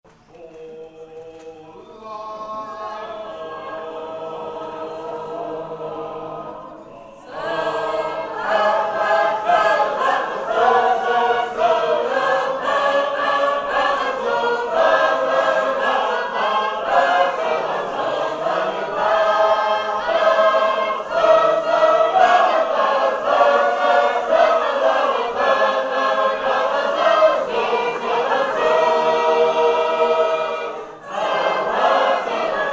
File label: Few Happy Matches